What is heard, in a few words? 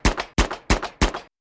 rev shots